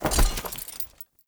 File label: Heavy Grunt Foley Body Fall; Sinecure; Enemy